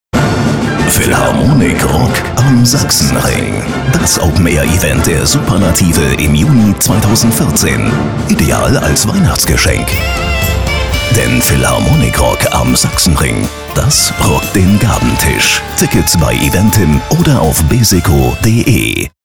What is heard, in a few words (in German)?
Rock Weihnachten